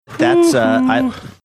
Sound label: "hoo hoo"